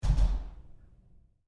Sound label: Door, Bathroom Stall, Close, Roomy, Lite; Big Room Sound